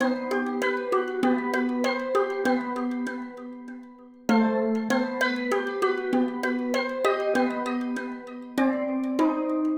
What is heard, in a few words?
Sitar, 098 Bmin, Hip Hop Exotica; Apple Loops; Big Fish Audio